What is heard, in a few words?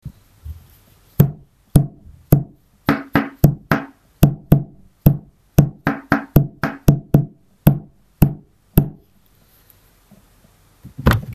taiko